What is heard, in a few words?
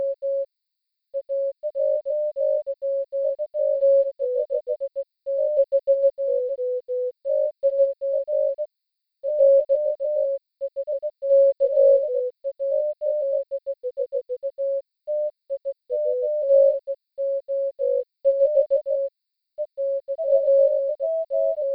Morse